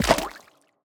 Otter footstep

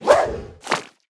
Otter sleep pre

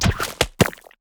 Otter taunt

